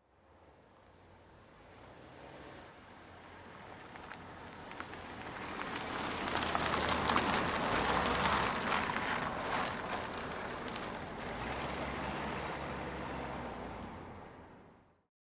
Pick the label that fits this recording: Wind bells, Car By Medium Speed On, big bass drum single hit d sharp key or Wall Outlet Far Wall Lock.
Car By Medium Speed On